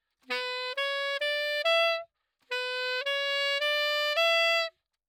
Sax

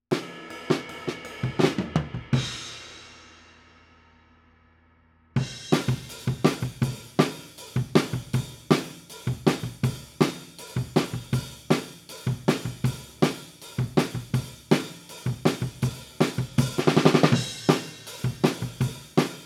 Kit, 160 BPM

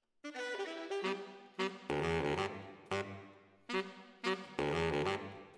ORTF SCHOEPS, piano

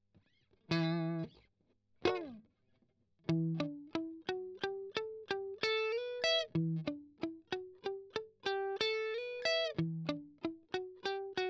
Strum Octav Arp

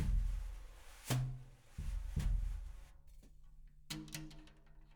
Kit

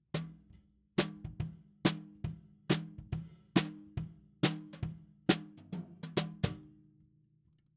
SNR BTM

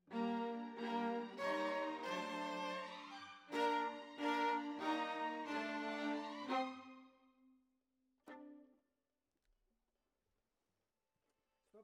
Violas, jazz for piano

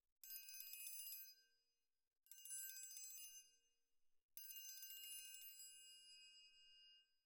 TRIANGLE CLOSE

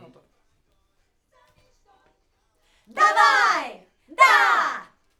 Backs AKG

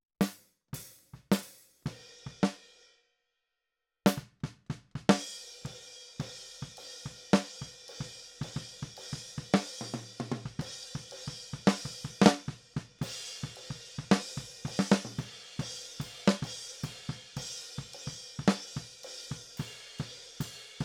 Snare

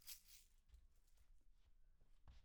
PERC OH

